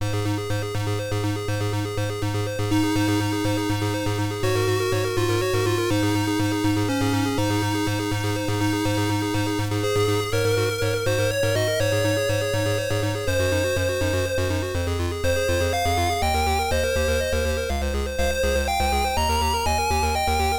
ジングル (PSG version); Euphory

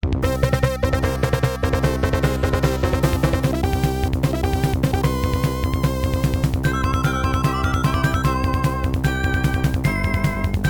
ボス FM音源版; fray msx remastered soundtracks 2008

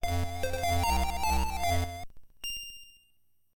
セーブ PSG版; fray msx remastered soundtracks 2008

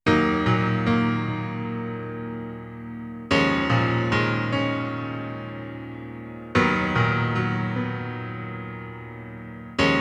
ピアノ; Hokenshitsu e Youkoso

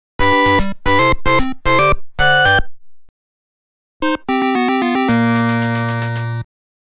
正解 不正解; B面ステップドリル; Sunsoft; Disk System

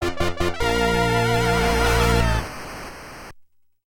ファンファーレ (FM音源版)

